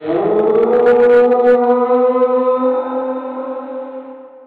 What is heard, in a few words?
Brachiosaurus Sound Effect; jurassic hall